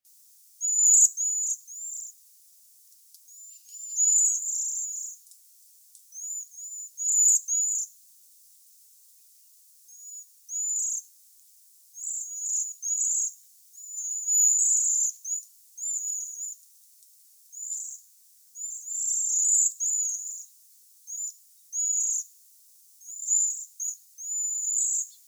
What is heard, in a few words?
Waxwing Sound